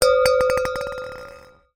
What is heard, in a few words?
household glasses wine crystal x2 hit together; zapsplat